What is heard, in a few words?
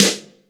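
snare, Drums & Perc; AKAI S6000 CD-ROM - Ultimate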